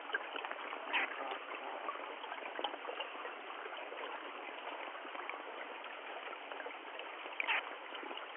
chirp, river project sounds; chirp groans

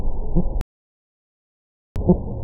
Serrasalmus eigenmanni cf; Amazon Fishes

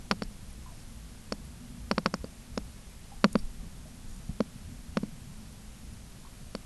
Bairdiella chrysoura, soniferous Carolina fishes

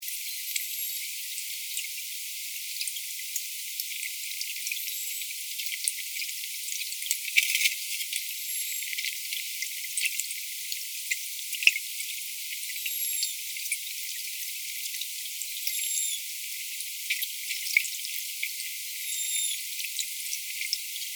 Amazon manatee whistles

